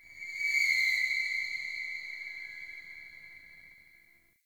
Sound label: Day Screechy Creature; Ambience; One Offs; Glades Of Andurin